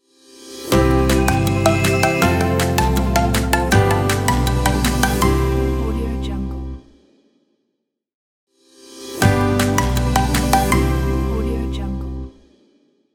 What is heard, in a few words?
happy logo